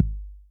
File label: oh no, Heartbeats; Kicks